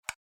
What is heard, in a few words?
computer click sound